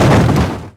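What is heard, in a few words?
Collision Interior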